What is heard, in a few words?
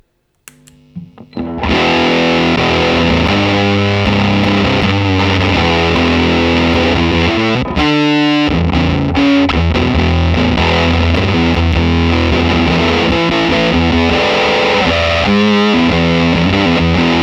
Fuzz